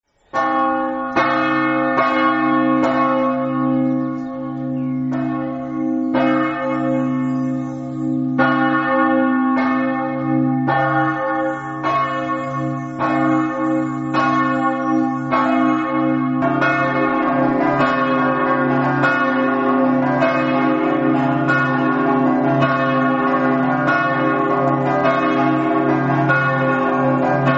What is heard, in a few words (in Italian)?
martignacco, campane